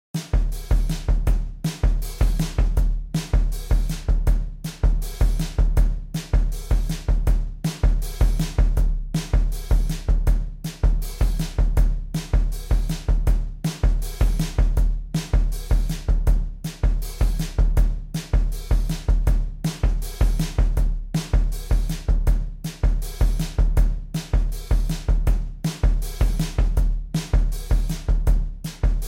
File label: Drums